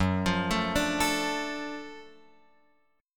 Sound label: F sharp Minor Major 7th Sharp 5th F sharp; F#mM7#5 Chord {2 X 3 2 3 5} arpeggiated